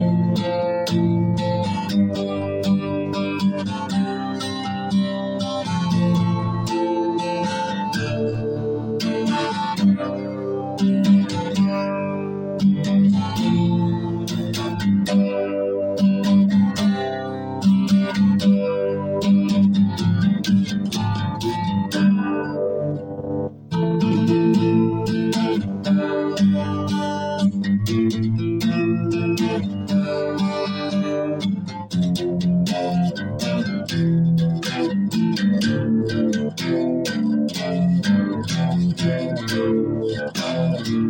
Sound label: guitar